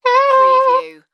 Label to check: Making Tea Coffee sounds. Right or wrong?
Wrong